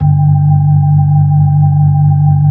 B-3, Brite

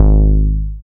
BAS MKS-50, Roland

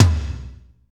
TOM, Drumscapes Roland

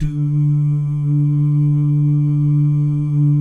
DOO GUYS, Vocal Jazz FX; Spectrasonics; Vocal Planet; JAZZ COMBO

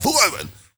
MALE RAV, MALE NOISE, Heart of Africa

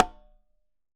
Congas Conga slap; Best Service; Festa Latina VOL-1; Single-Hits

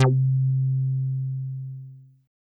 HOUSE BASS; Masterbits; Dance Trax

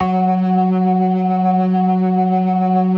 DRAWBAR VIB, Synth and Organ

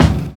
60 S BEAT; Univers Sons - Kitch 70s

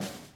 SNR, DRUM ZONE; Masterbits - Total Zone

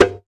Dholla Sak; Roland BK-5; Percussion; Dholak

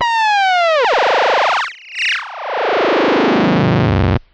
Monotron Lazer War

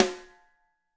SD, Drums; Bob Clearmountain Drums I

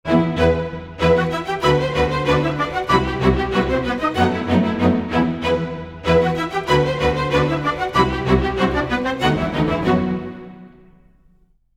95 e min 4 bars full mix, orchestral arrangements

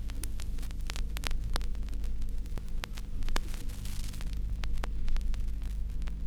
Crackle, Vinyl Noise

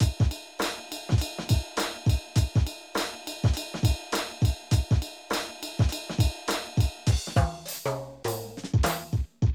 Drum loops, Hip-hop

